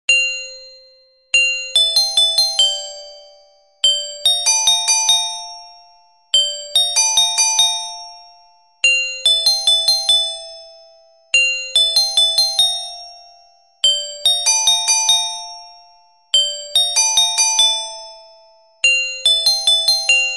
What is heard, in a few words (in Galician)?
Xota Carillon Contralto